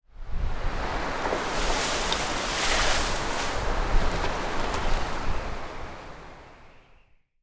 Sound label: ambience foliage; smite; conquest